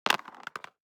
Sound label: DICE on Cardboard, Throw and Roll, Standard, 4 Four Dice; Samples; ArticulatedSounds; DICE Sfx Party (Extended)